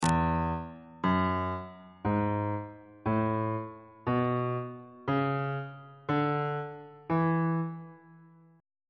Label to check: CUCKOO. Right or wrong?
Wrong